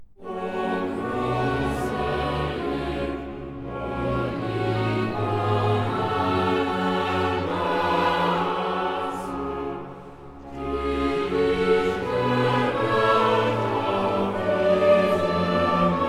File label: Choral; O große Lieb; Klassika; Bach, Johann Sebastian; Choral Works; Johannes Passion BWV 245